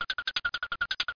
icon Drag Loop; bigtop

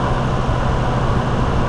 car

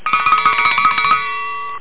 dingle, ring; term

